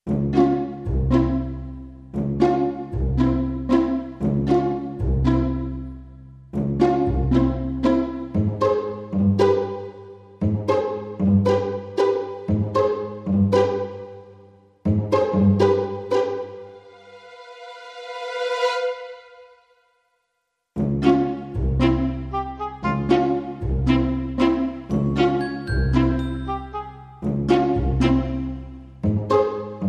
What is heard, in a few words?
Modern Percussion No Percussion